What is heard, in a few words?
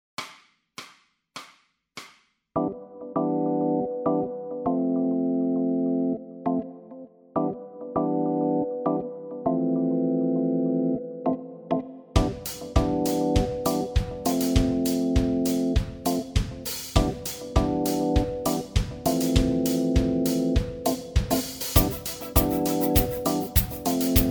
bass blues funk, backing tracks